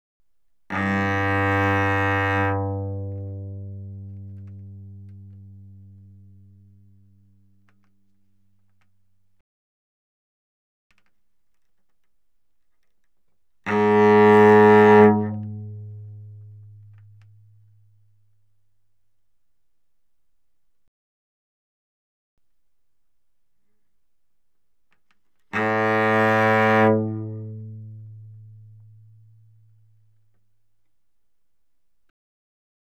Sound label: Cello arco ff sul G G2B2; Strings